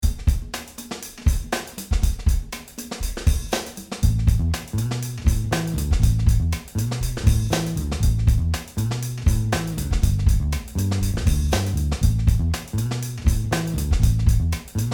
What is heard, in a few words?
Music loop